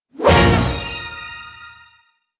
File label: Bonus Level Unlocked